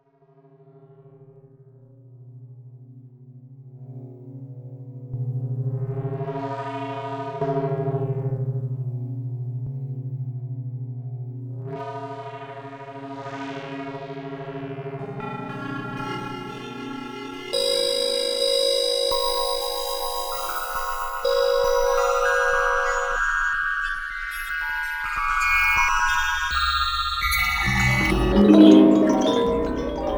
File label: granulator